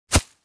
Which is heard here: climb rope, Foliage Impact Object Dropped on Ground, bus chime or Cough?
Foliage Impact Object Dropped on Ground